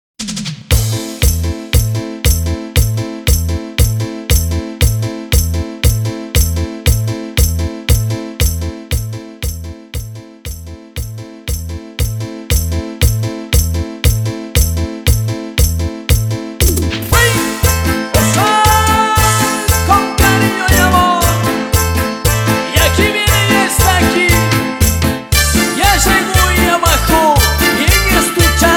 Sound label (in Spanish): Intro Simple Bpm 117